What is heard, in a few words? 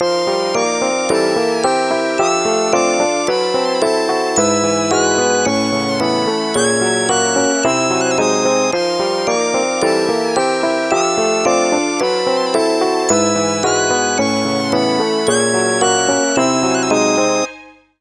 Country